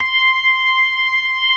CLASSIC C3, Synth and Organ

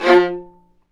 String Sections, Violas Marcato

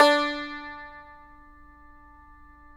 TAR; Roland - LA Composer vol. 3

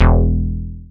bass, synths

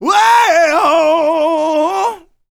R ROCK, MALE HUMAN; ILIO; Vocal Planet

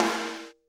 SD, Drums; Bob Clearmountain Drums I

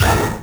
Orchestra Hit; Genesis

